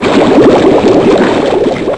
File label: waterfall; Sound Effects for Multimedia - Volume 1